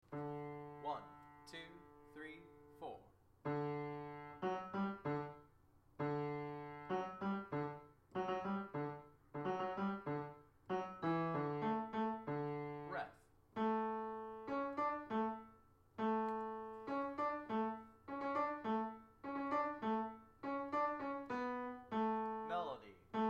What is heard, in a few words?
Hall Bass, hallelujah chorus